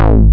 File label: bass